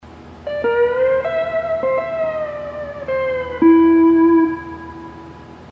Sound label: hawaiian piece